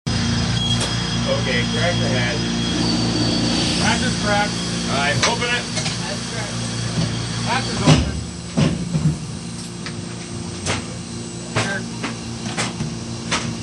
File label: crack the hatch